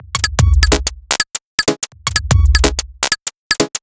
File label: 125bpm, House Drumloops